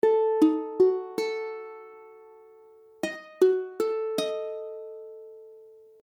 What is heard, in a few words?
Ebdim, akordy ukulele